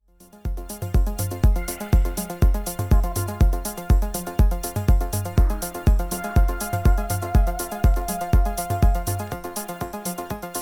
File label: Stanton T.92 M2 USB int. Interface Ortofon OM10